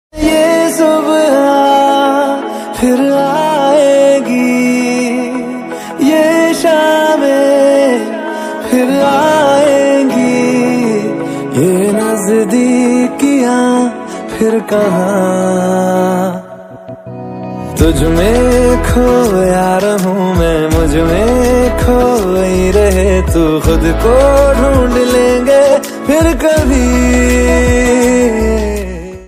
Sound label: Romantic Music Tone, Hindi, Only Music Tone